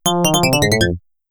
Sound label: game over